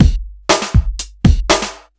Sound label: Funky Beat, Drums